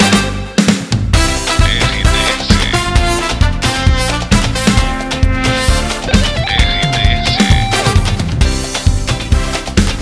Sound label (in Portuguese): trilha para locução axé folia